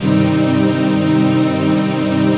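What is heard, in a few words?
chord pad, Pads; Best Service; XX Large - Pads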